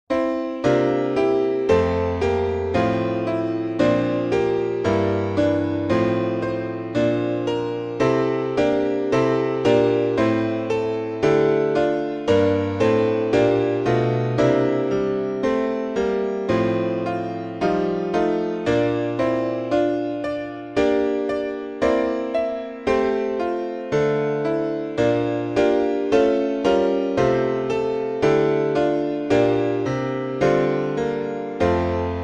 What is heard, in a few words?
Piano Stanza